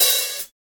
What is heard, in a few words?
verby open hat sample b key, Royality free open hi hat sound tuned to the B note.